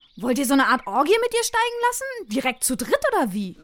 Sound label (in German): Orgie